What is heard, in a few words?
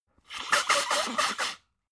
nose blow wiggly; soundpool